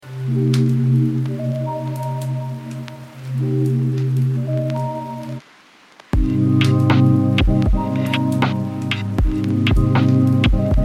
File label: Dijon Lofi, Chill Beats